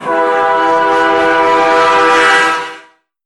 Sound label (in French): son klaxon train americain trompes usa 4 trompes chromees; avec entree air 8mm